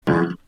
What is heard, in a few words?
fart sound